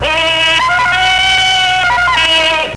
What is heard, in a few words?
Rope; The Wild Thornberrys Movie